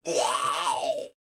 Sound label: Blob Death